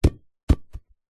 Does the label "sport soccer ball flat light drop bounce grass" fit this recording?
Yes